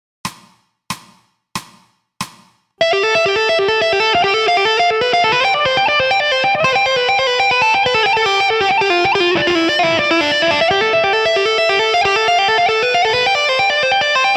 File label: Technical Study, electric guitar; Technical Study 1